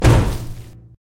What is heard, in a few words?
Mech Stomp